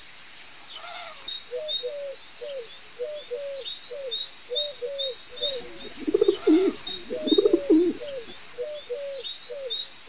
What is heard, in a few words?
pigeon; de ivytown